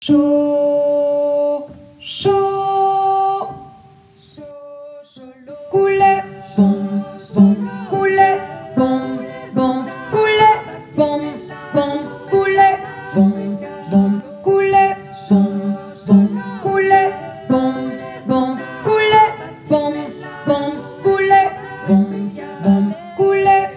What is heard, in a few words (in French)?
shosholoza voix séparées basse